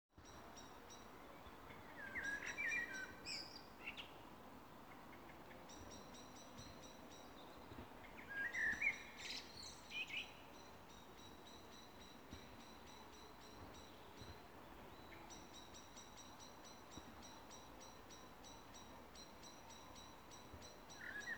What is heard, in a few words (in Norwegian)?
smett